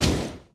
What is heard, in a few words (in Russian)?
Звук уничтожения карты